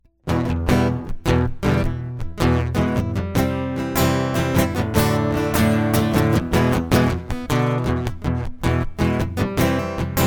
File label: acoustic